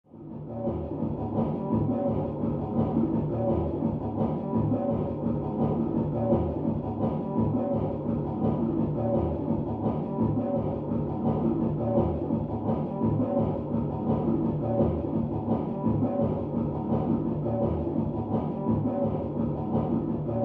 Mx Electronic Dance

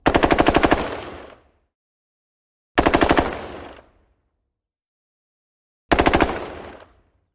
M16 Rapid Fire

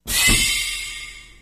Mechanical Door, pneumatic

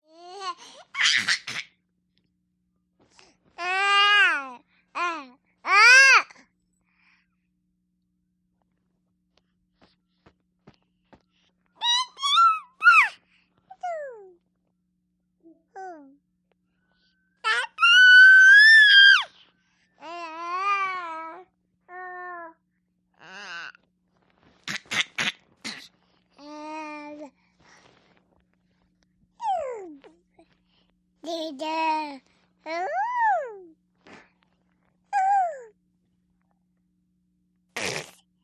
Baby Girl Play On Bed, ten month old

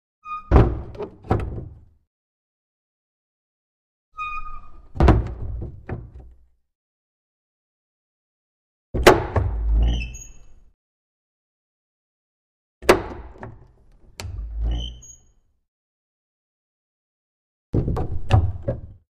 Small Wooden Door, crash slams

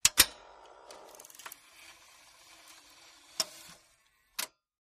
video deck load; video tape deck

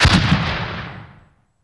Grenade Launcher

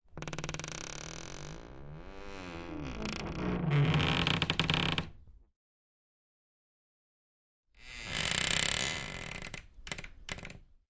Door Wardrobe Creak; hinges creaks

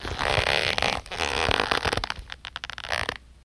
Leather Stretch, books

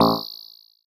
synth boing

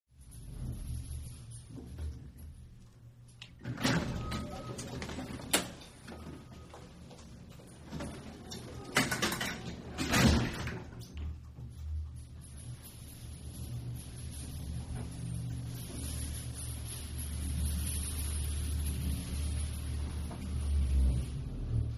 Elevator Old style, elevators

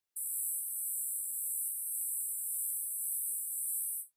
Alarm Beep Pulses

